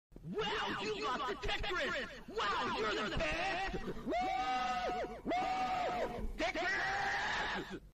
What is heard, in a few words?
tetris 4 lines